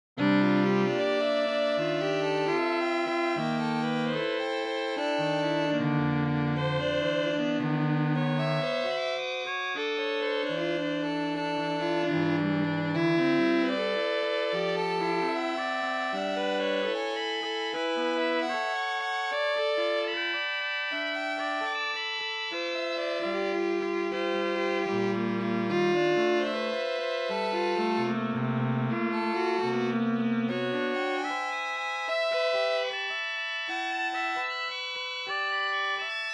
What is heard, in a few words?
squaring the fifth quintet